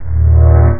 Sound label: uncloak; ships; ilwrath; sc2